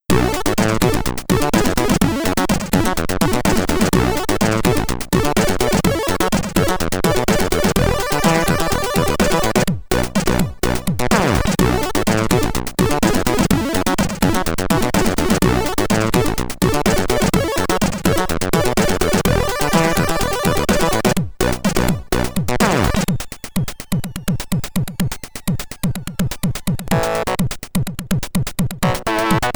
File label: BGM, commodore 64